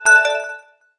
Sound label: system, message tones